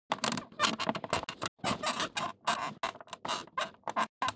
creaks, household; NS Studios - ultra sound collection

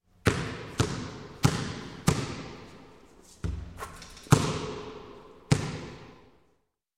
Basketball Sink Basket; Sound Ideas 2000 Series

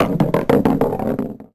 Golf Ball Roll Metal Pipe; Misc Sports

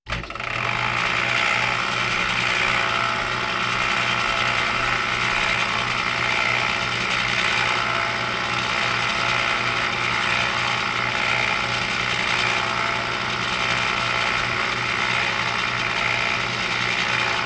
Walking Machinery Trucks

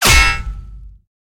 gunshot hit bullet; Blind Drive

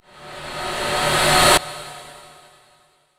NOIS; XXL 800 Production Effects

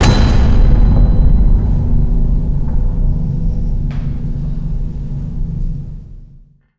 Cinematic Metal Impacts; boom library

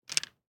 DICE on Felt, Grab, Standard, Four Dice; Samples; ArticulatedSounds; DICE Sfx Party (Extended)

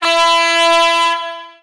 Basketball Horn

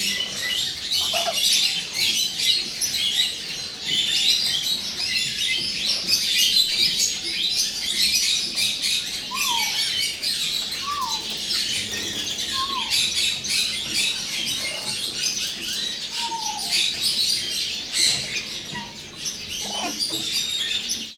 birds farm; best service Blue Box

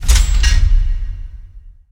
Door Heavy Metal Close; Doors

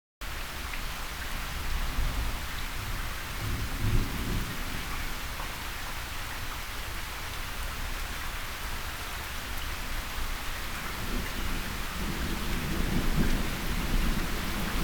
water stream rolling thunder; best service Blue Box; water streams

